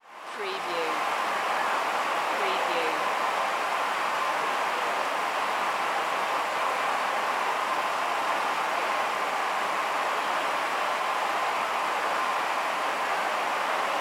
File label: Sports Crowd Large Outdoor Stadium General Crowd Noise